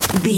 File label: footstep snow run